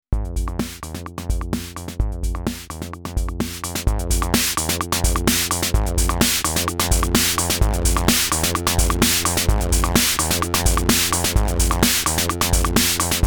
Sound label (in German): Akai Rhythm Wolf Howl mit Noisefloor